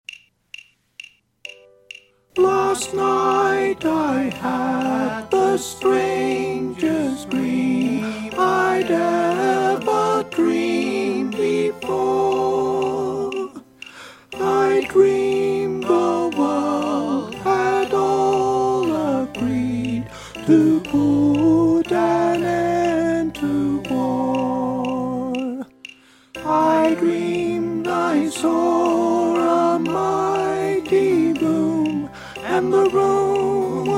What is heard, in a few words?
alto